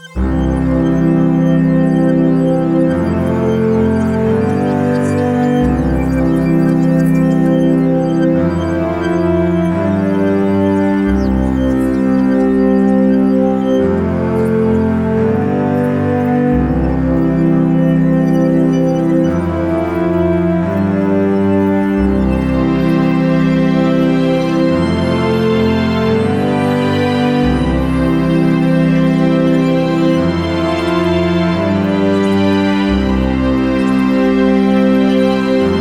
Podstrings, Rhythm and Strings

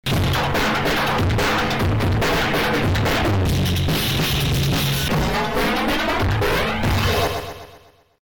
drum

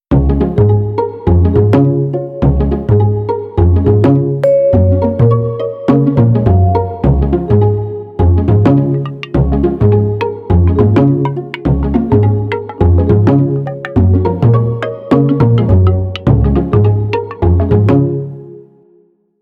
Pizzi

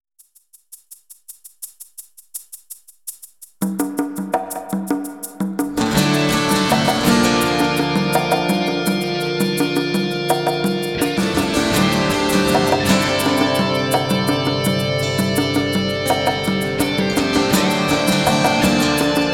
Uplifting Hopeful Mostly acoustic has congos; CUES for film TV games etc

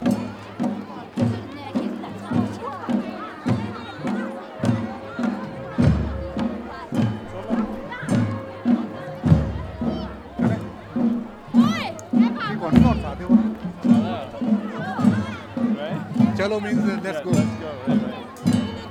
Jach Festival in Kulu Valley; Samuel Corwin; Selected Field Recordings from India and Nepal Volume II Religious Music

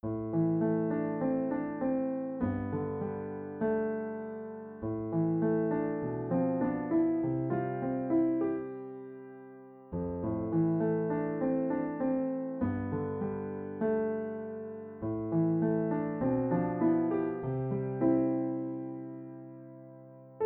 Piano Music

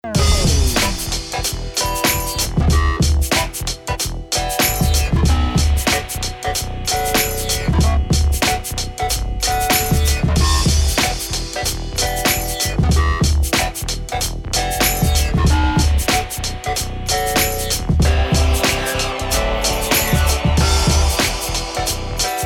Instrumental

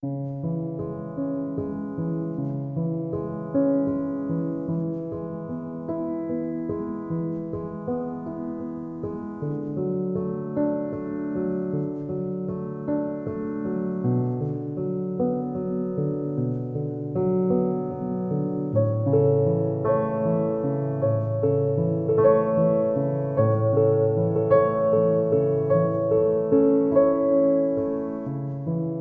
Osprey, Piano Preludes

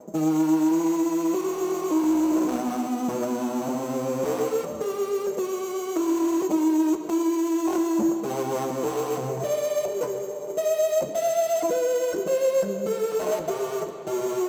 Weird serious jingle of death

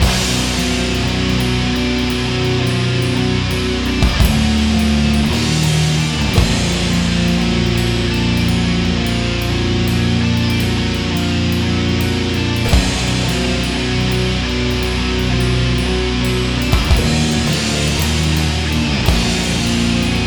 Dark Heavy Anger Fear Metal; CUES for film TV games etc

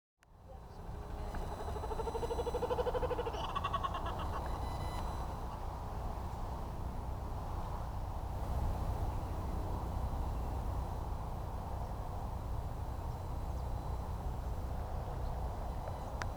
Kookaburra Interferences, Australia; Les Cartes Postales Sonores; NOISE ON EARTH Vol7

